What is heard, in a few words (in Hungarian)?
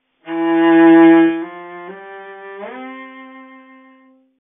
csello; erkel